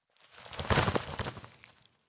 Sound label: Clothes